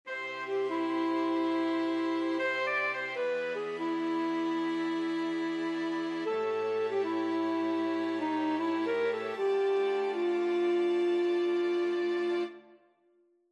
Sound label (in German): statisch Streicher voll